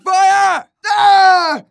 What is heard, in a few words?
fire, player; Team Fortress 2